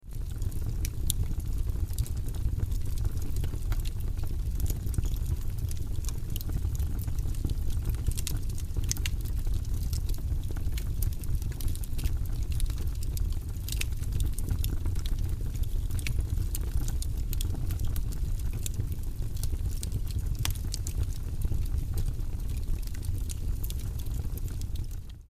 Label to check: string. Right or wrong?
Wrong